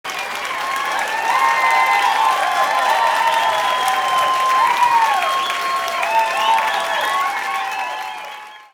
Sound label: Cheering